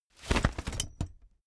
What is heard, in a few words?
umbrella big open full hard